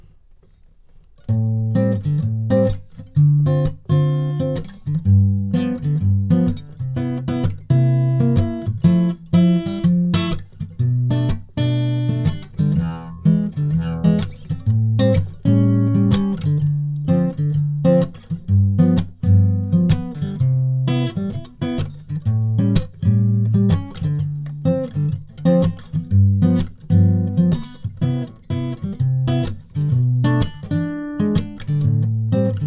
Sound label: Guitar